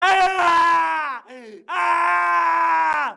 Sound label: scream; joker